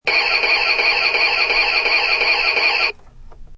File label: High pitched sound of a toy car’s ignition sputtering and failing.; Toys